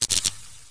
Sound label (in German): stromausfall lampe; minecraft